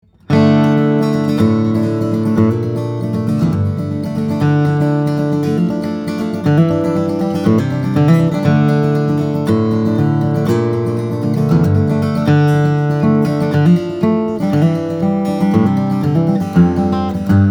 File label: steel string flattop guitar; td-r custom; brazilian rosewood; adirondack spruce; Huss Dalton